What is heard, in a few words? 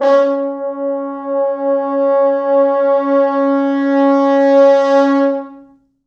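4 French Horns, Horns; East West; Quantum Leap Horns Sax; 4 FrHorns MOD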